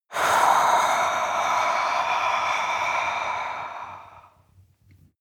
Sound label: breath female exhale heavy scratch